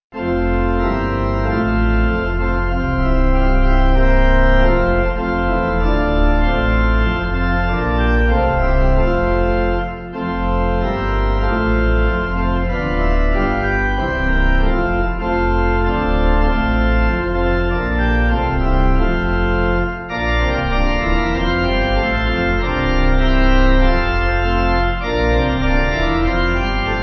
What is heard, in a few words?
Once In Royal, Pipe; Irby